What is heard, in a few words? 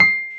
Wurly, Keyboards of The 60's and 70's